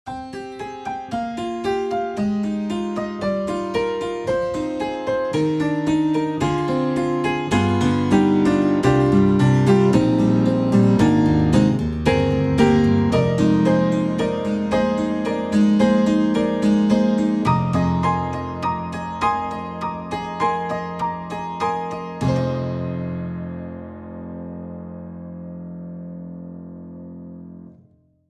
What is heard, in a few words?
Tack Piano Aged; Spectrasonics; Keyscape; Wing